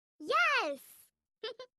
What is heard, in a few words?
Cheer; slotssister